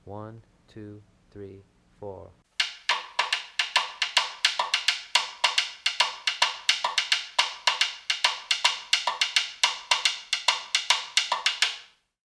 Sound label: cascara basic